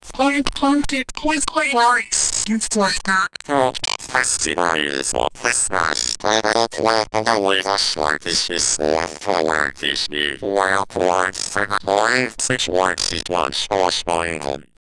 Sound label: first comes the fart then, announcer